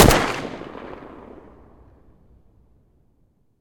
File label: galil muzzle mono distant